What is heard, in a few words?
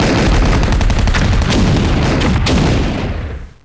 guns, battle